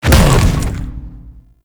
bowl ball impact; Warframe; Grendel

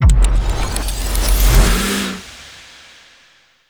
Drifter Death Stop; Ambience; Gameplay; Duviri

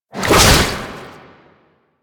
Underground Attack Tentacle Swing; Enemies; Abilities; Warframe; Proto Infested; Babau

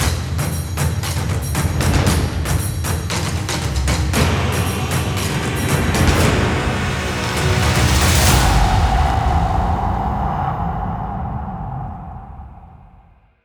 Fishing Success, Music; Warframe; Deimos

